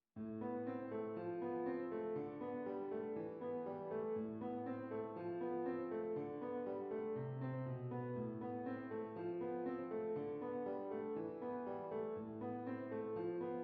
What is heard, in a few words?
Flute and Piano